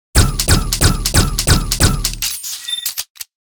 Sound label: Hand cannon rapid fire mode